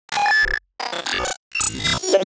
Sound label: Vox; The Weird Side Samples; 99Sounds